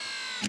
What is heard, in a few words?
zoom out; Samsung SGH-i740; Camera Sounds